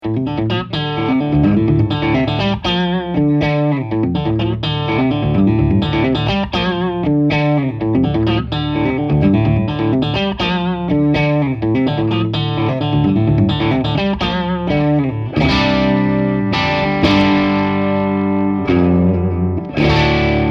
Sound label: Tele Clean; egnater